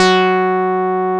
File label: MC 202, BASSES; Masterbits - Vintage Voltage; VV BASSES